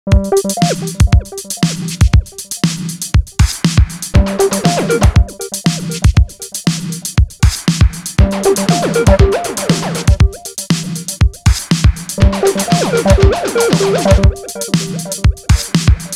Techno Loop

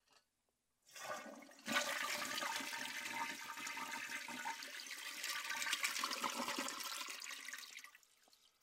Toilet Flush

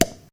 Flopp Dry

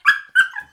Small Dog Bark

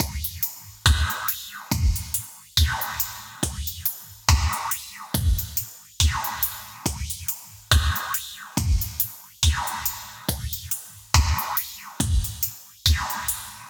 70 Drums Steppers Fx Slow

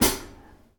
Closed Hat

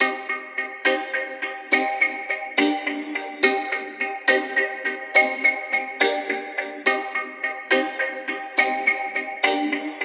70 C min Skank Echo; Zulu

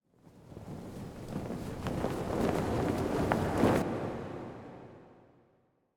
Scarf Waving

